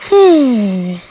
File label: hunter death; catnap n grabpack; elmo